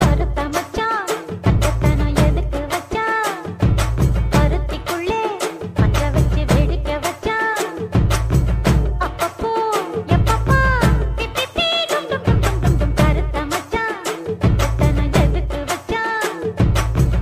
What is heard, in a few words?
remix song ringtone